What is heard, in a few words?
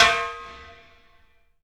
PA snare; E-MU Producer Series Vol. 5 – 3-D Audio Collection; 3D Percussives; PA Snare FX